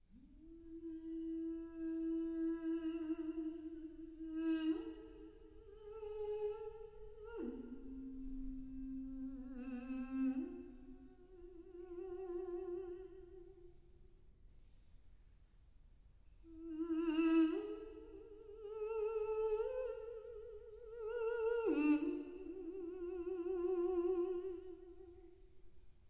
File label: version for piano with soprano; a song built from fire ii